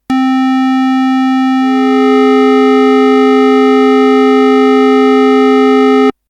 kleine Sexte; harmonie

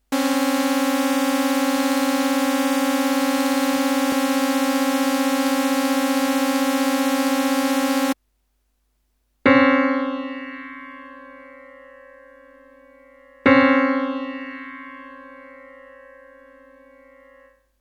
kleine sekunde; harmonie

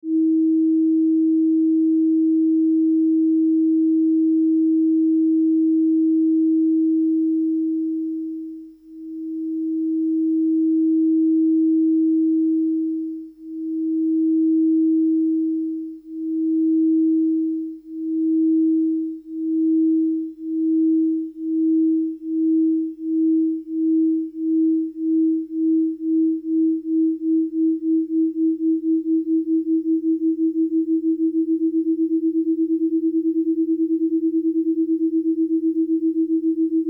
verstimmen sinus; harmonie

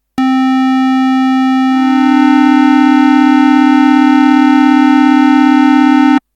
sekunde; harmonie

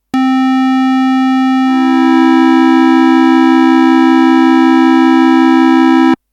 grosse terz ot; harmonie

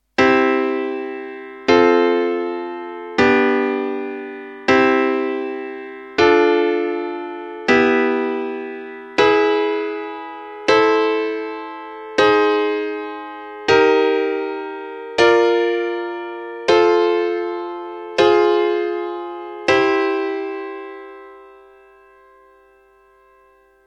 akkorde rein; harmonie

